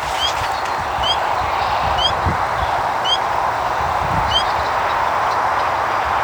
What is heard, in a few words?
pseudacris crucifer; iNaturalist